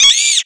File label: rat die; critters